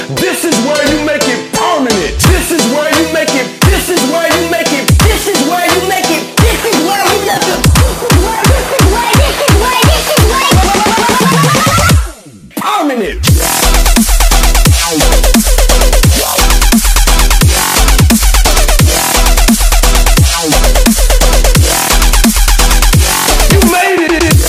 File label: Dubstep